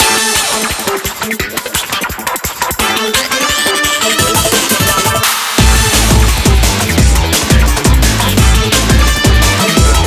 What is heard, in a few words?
Bgm Bomb